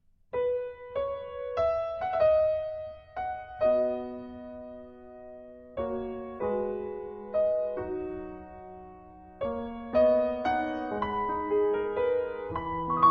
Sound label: Piano; How To Train Your Dragon